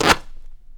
Canvas Bag Rips

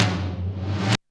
REV TOM HI; Back In Time Records; Big Bang; ST.REV.TOMS

